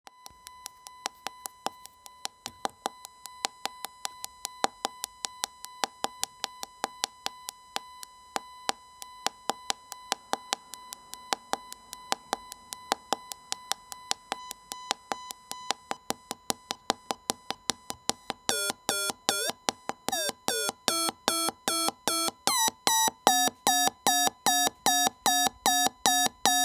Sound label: lfo sem patch, synth